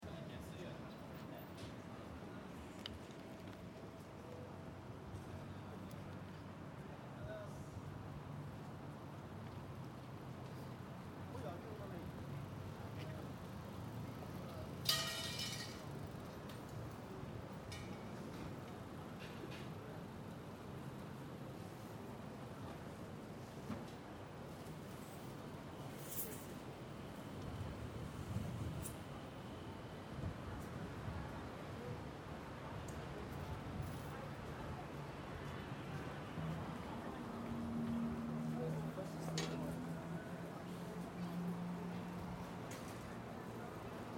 静かな人混みの音

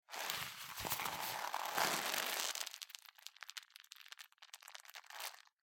革の手袋の音

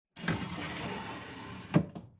襖扉を開ける音; door

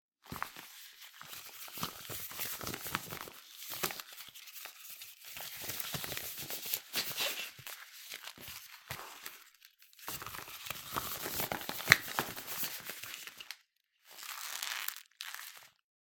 ゴム手袋を手に取ってつける音

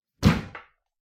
シンクの下の引き出しを閉める音

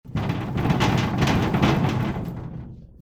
和室の扉がガタガタ鳴る音; door

